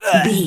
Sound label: voice male grunt pain